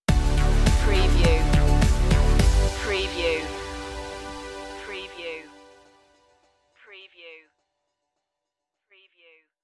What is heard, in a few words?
sparkle stinger